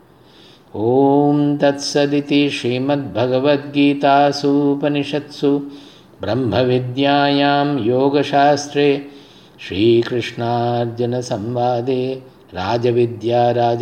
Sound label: Ending Chanting; chapter 9 rajavidya rajaguhya yoga; bhagavad gita; conclusion